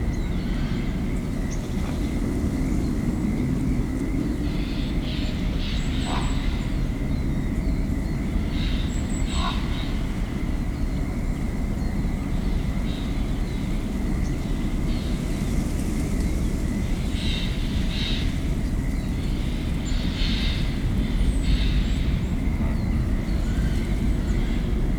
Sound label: eerie forest, ambiance